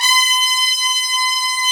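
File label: brass, Tpts Sec. f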